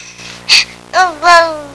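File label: BABY TALK; persone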